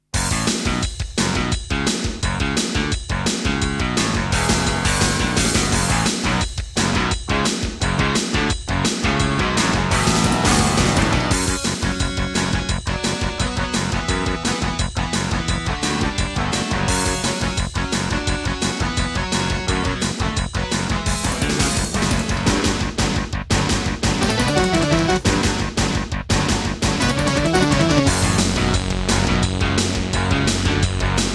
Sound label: Intro, Terratec WaveSystem SCW001-2; Duke Nukem 3D